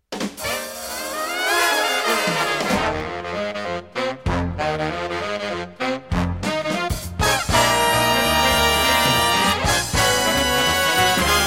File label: Kicks and Sticks